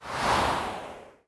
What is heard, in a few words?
zoom; Crash the Bash - Drink Break